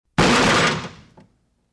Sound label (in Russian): rezkij udar